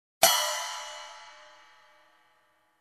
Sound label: Hard drum ride hit